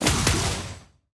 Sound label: action attack; lucian